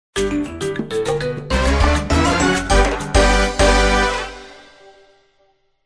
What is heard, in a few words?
victory music; reversevape